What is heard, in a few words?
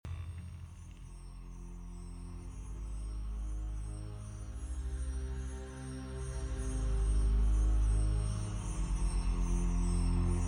Pale Instrumental